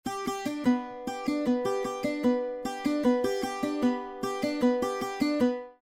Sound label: son intro g major